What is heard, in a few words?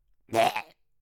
male death